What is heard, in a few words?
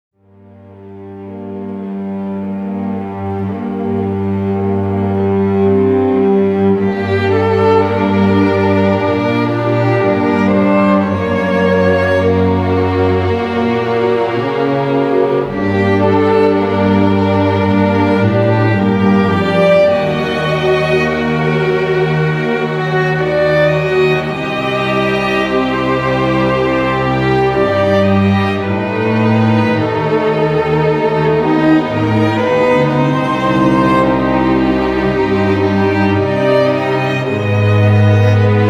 Native Music theme